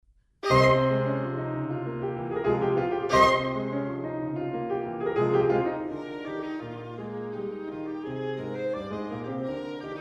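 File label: violin sonata; magnard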